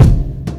Kick

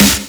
Snare; VEC1; Club

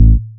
Bass A, Offbeat Bass; VEC1; Club

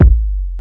Kick

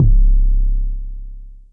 BD Sub; VEC1; Club

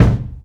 Kick, Hip-Hop, Drum Kit

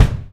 Kick, Hip-Hop, Drum Kit

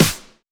Snare; Takebeat; Club; VEC1

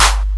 Clap; RnB

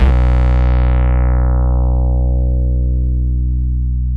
Bass Long H; VEC1; Club; Long Basses

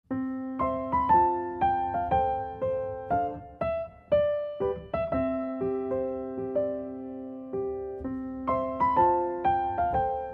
Main theme (piano version); Hana Yori Dango OST2

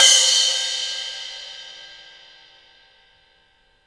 Cymbal, Hip-Hop, Drum Kit

